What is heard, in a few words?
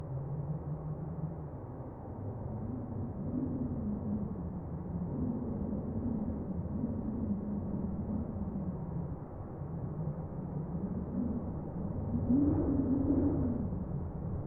Wind Loop